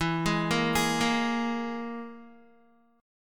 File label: E Major Flat 5th E down Guitar; arpeggiated; 4F,4A,5C,5G,5C,6E